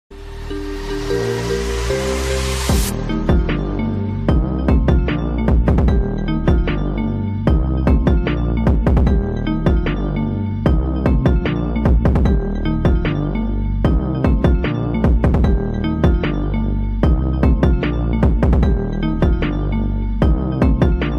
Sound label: sigma ringtone